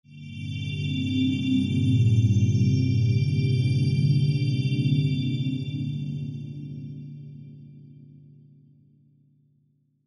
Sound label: Swell Dreamy; Blastwave